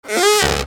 TOYS

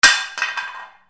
FALLING

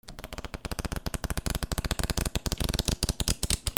CASINO

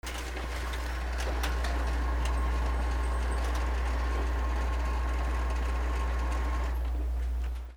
OFFICE

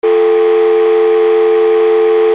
PHONES

